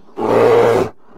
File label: bear hurt; mob